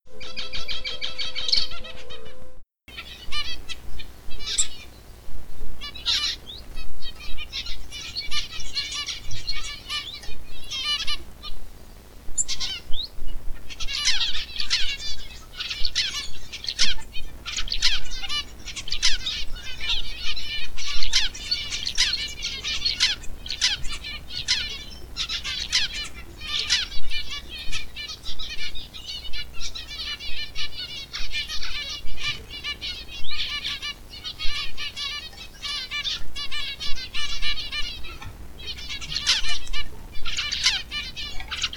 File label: zebra finch call